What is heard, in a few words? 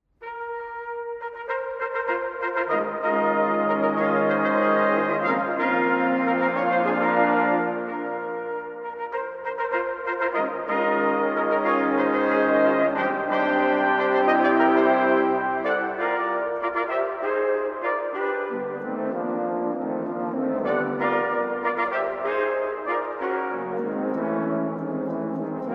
fanfare; erasmus